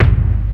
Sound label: hardcore 1, bassdrum; Ueberschall; Techno Trance Essentials; DRUMS; BD HARDCORE2